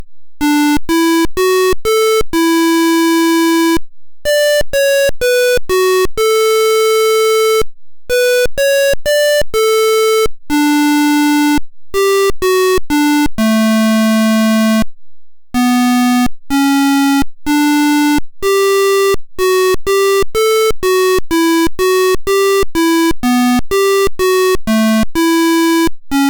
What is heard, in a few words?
8 melodies